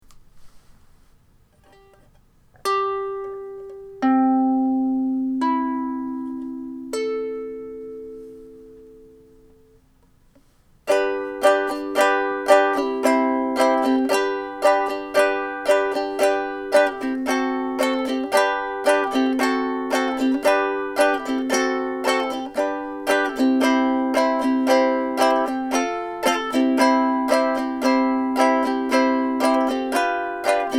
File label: cedar birdseye maple